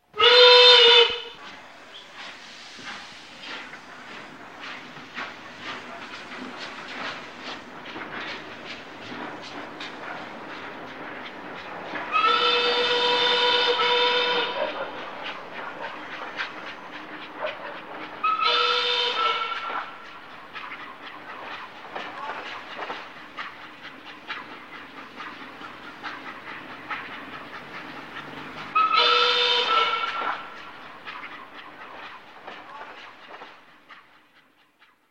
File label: steam engine